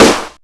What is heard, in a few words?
snare sound a sharp key; Duration 0:01; Mono